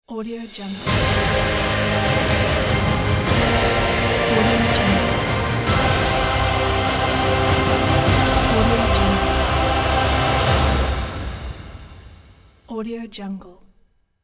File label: euphoric massive epic logo